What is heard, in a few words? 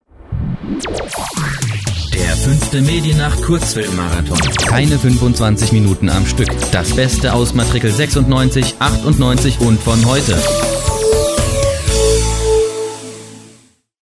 psr mn5 soft